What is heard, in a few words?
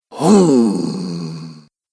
Sumo Male Strength, recorded voice; Twistedtracks